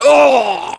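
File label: pain severe, French; (Grognement 3); Heavy; Réponses du Heavy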